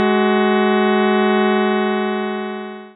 dom7 ET

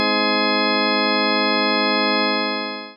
octave Partials INV; third

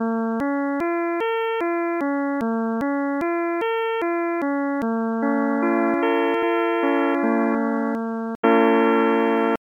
triad sep chord INV; scale; third

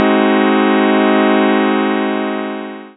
dom7 ET

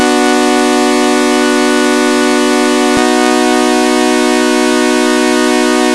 saw just

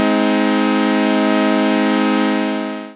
triad INV; third

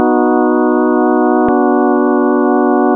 sine 12 equal

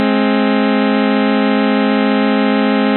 3rd ET vib; third

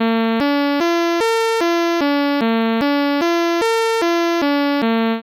b chord vb ET; third

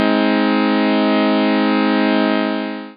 triad AJI; third

